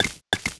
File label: Alpaca run